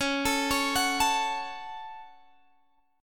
C sharp Suspended 4th Sharp 5th C sharp down Guitar Open G